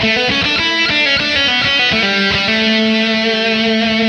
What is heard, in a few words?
lead, 100